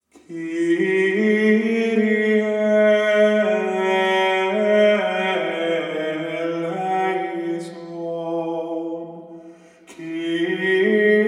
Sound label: kyrie requiem; ordinary